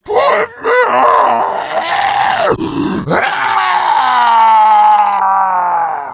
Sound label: pain; slender; zombiebm